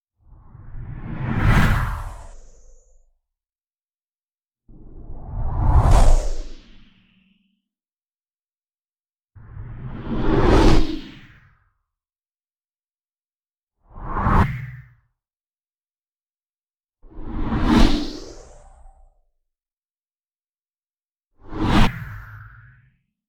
Whoosh Filter Sweep Short; Whooshes

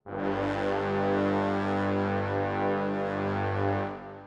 Dramatic Brass

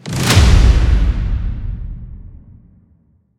Deep Impact

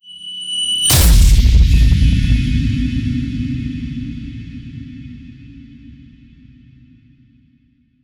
Tension Builder Impact

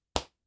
Rubber Underpants, Clothes

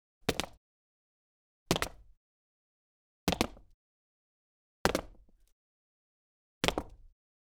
Gritty Cement Heels And Jumping, Footsteps

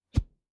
Punch Swoosh; Hit Whooshes

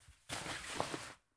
Rustling Down Jacket

